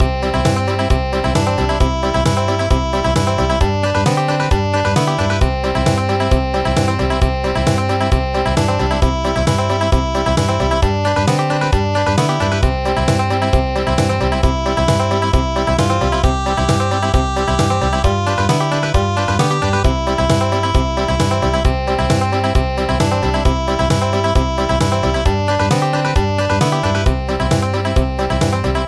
Country

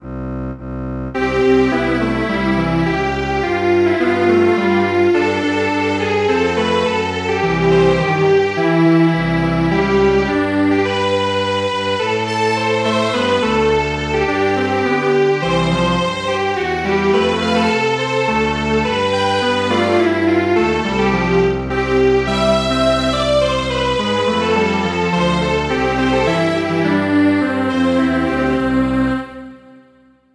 Strings Quartet

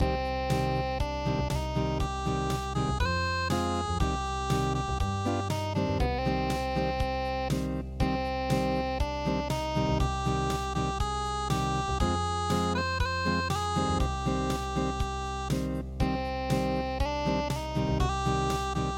unpluged, midi; ferryman song

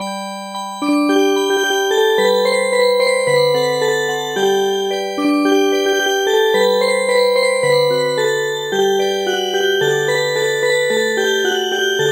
Music Box; The dance of barley

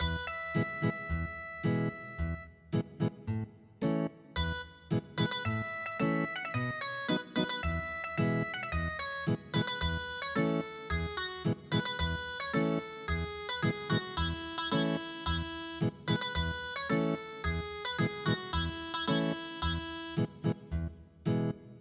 Ethnic Guitar; traditional

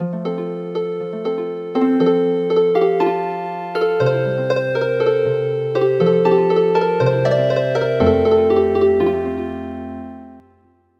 Healin Harp; traditional; Names of Days

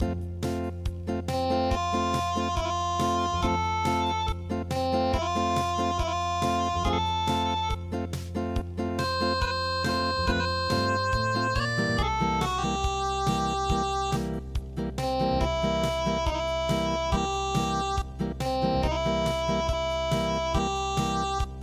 Unplugged

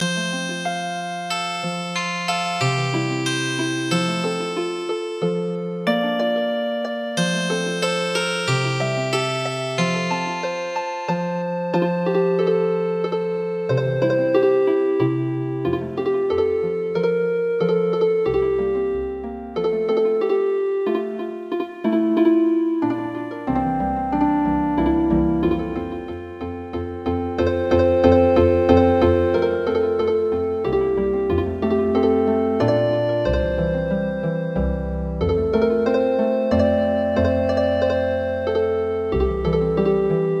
Healing Harp

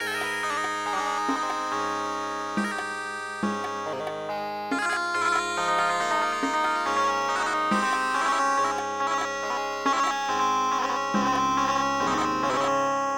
Dance, Indian Traditional; Tippani

